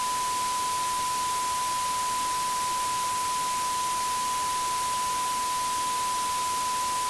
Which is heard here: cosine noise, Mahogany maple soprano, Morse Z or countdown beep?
cosine noise